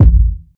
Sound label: low kick drum g sharp key, kick sound tuned to the G# note. Loudest frequency: 90Hz